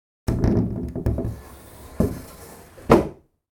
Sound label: indoor